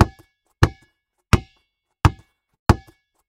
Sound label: basketball dribble